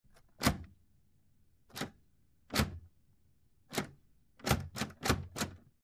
Door Knob Single, rattle door

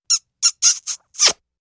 Kiss; kissing

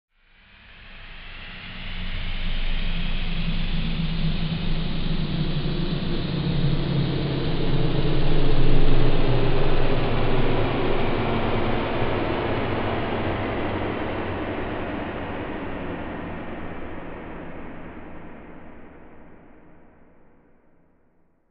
Wash Slow Airy LFE

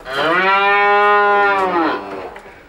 Cow Moo Generic

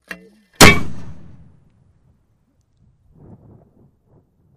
German 88cm Mortar Ha, mortars

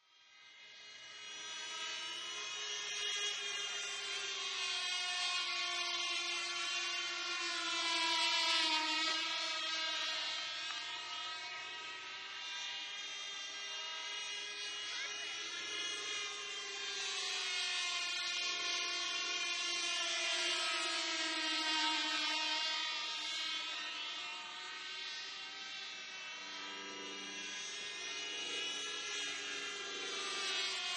Rc Plane Radio Contro, rc airplane

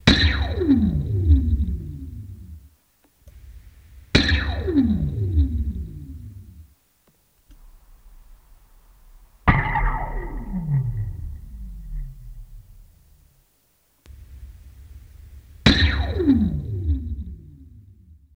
Laser Shot Mono X4

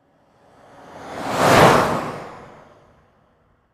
Expedition Very Fast, ford expedition

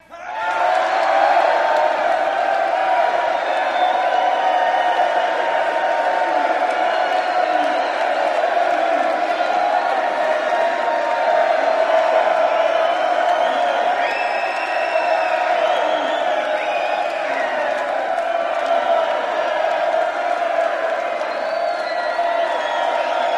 Crowd Excited Winner, big arena

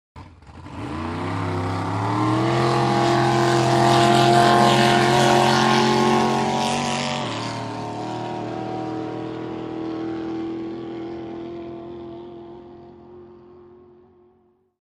Drag Boat Start by

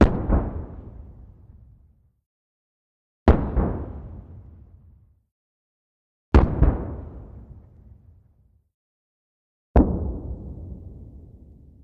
105mm Howitzer Shell

